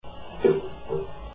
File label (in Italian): colpi